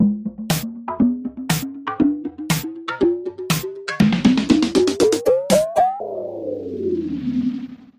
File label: Mixed Drums 120 C; Beach Day